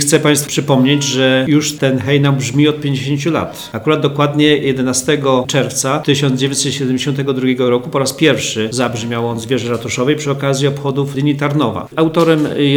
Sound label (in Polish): hejnal, hejnału z wieży tarnowskiego Ratus; Kurczab; polwiecze tarnowskiego hejnalu